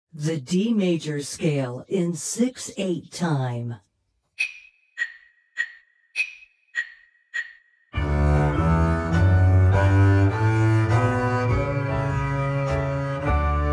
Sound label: The D Major Scale